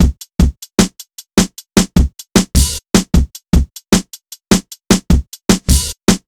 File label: drum loop; M8; percussion and foley